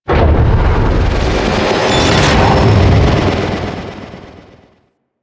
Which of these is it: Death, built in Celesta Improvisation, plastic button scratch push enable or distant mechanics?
distant mechanics